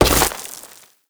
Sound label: dirt impact bullet; physics; surfaces